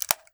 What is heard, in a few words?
m4 dustcover, weapons